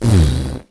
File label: big fart with lots of air